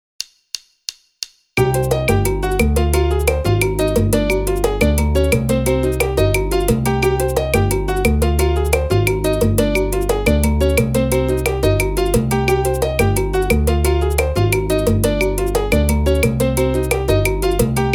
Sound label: Latin, drums